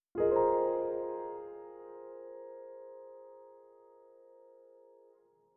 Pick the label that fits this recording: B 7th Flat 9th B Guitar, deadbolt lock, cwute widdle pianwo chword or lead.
cwute widdle pianwo chword